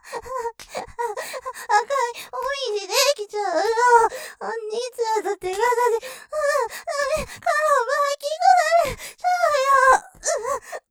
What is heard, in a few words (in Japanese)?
喘ぎ; 赤い紅葉できちゃう；マーキングされちゃう；; ループで楽しむ！シェフの気まぐれ抜き抜きコース